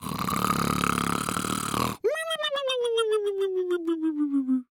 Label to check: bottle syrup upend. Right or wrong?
Wrong